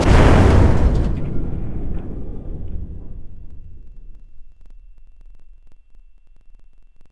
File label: Submarine Hit; Civ4